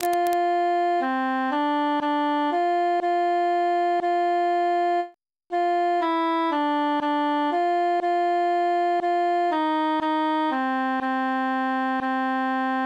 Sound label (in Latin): altus solo; o occhi